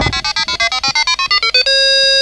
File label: sound startup; maximus